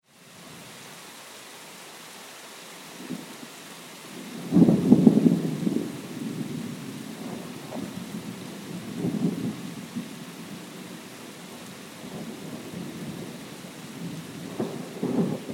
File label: Bad Weather Heavy Rain And Thunder; Outside Ambience; Andrew Fleming